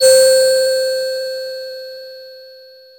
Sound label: TX C4, SYNTH; AKAI S-Series CD-ROM Sound Library VOL-2